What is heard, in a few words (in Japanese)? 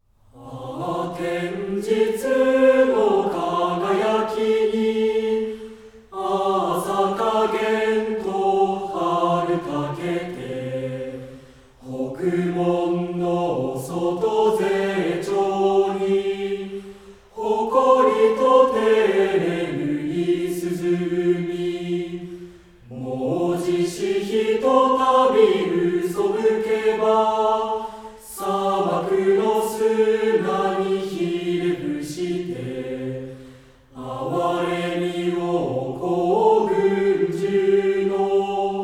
混声合唱; 送歌